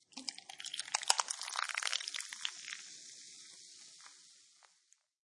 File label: Pouring fizzy soda into glass; Objects